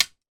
household childs fishing net hit internal wall

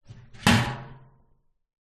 household trash can metal lid close clang

